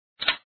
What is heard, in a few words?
flip